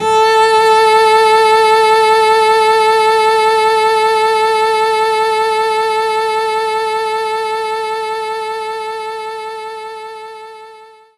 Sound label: Cello A3